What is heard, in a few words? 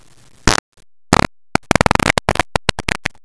fart